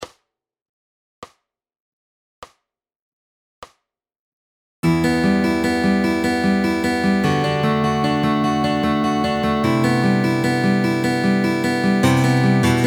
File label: Fingerpicking Both Parts